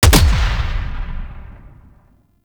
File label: Exalted Sniper Punch; Warframe; Frumentarius